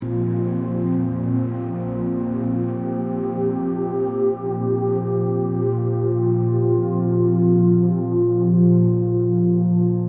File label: gentle ripples, ambient